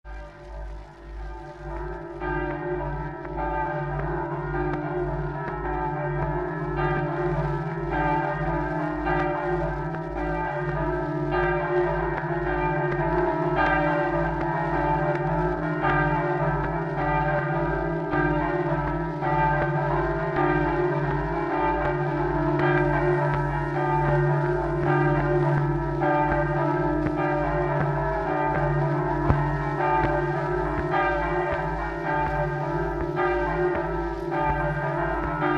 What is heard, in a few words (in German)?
Glocken; Das Beiern und Bammschlagen ist eine alte Tradition aus dem Mittelalter; schuetzen brenig